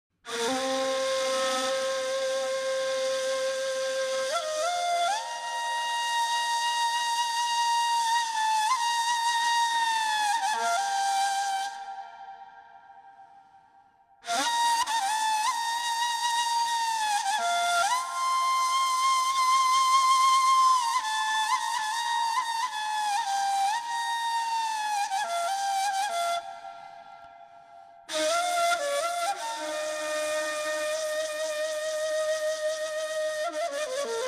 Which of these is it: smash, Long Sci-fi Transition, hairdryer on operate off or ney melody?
ney melody